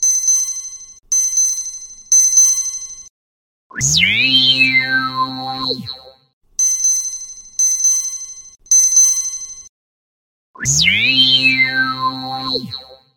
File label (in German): weckertone android